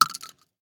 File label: Shell 12ga gravel; STALKER; IWP